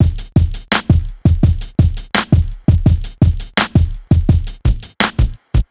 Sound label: Hip Hop Beat, Drums; Digidesign; Pro Tools; Pro Tools Essential Loops